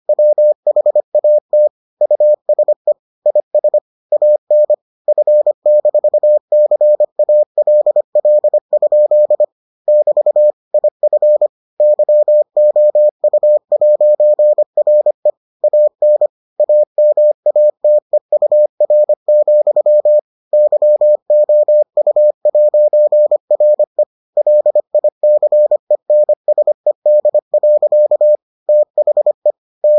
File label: morse